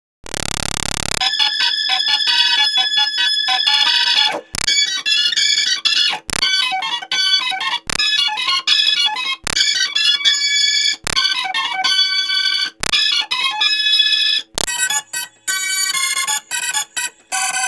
B3 Organ; Masterbits CLIMAX 8

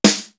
tam snare; East West; Global Sounds

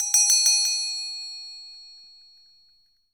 silver bell; ILIO; Synclavier Percussion 2; World & Orchestral

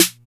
808 MARCHSN; Drums In Your FACE!

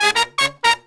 120BPM, Horns; 90 s Sample CDs; Zero-G; Phantom Horns

